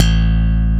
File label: Slap Bass A0; Proteus 2000; Multisamples; Digital Sound Factory Proteus 2000